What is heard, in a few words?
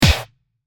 punch